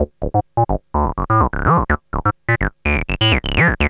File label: TB-126